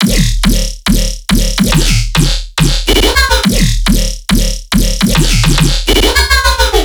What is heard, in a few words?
Riddim Bass Loop 140 D# Groove; Dubstep; Riddim Basslines; Ghosthack; Advent Calendar 2019 Day 17; Riddim Bass Loops